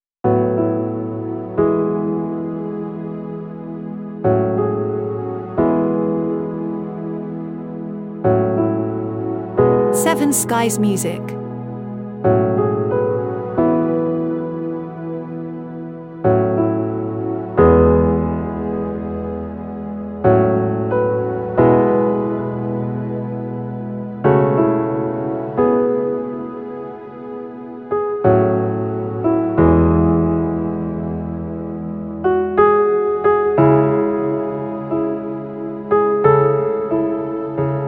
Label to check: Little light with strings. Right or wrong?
Right